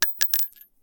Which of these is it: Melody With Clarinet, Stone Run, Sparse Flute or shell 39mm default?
shell 39mm default